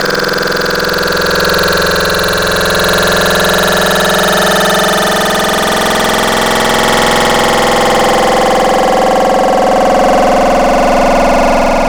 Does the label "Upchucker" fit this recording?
Yes